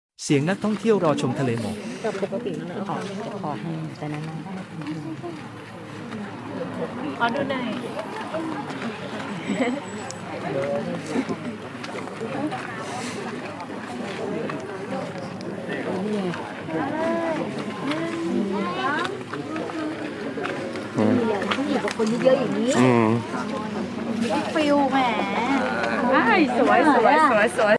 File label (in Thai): เสียงบรรยากาศภูทอก; ภูทอก-เชียงคาน